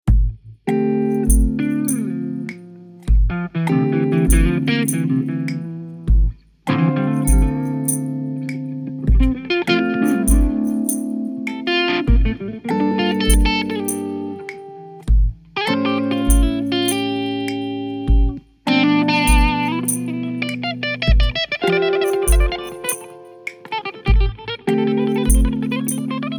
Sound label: Five-Four Guitar Groove